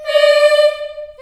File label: D4, Choir, CHILD; CHILD IHS